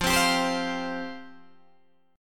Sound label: F Suspended 2nd F Guitar Open D